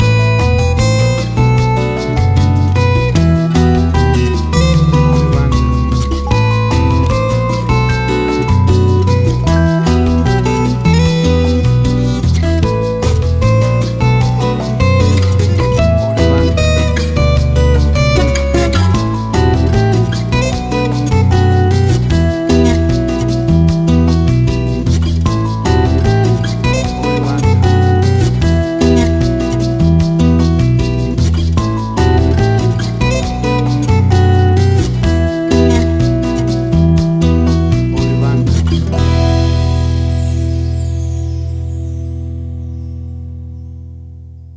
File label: Acoustic Latin Jazz Band; 30 SEC